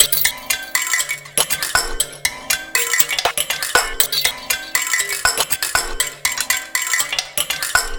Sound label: Finger Piano Loop, Ethnic Instruments; Sonic Foundry (Sony Creative Software); The Electronic Music Manuscript Collection